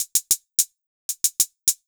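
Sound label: 110 hi hat loop, Ragga & Dub Reggae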